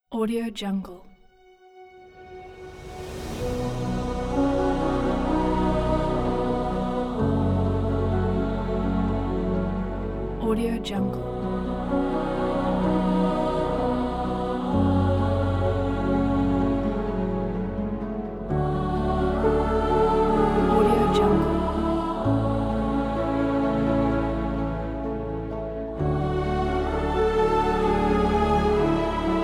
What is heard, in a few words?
celestial choir and orchestral rise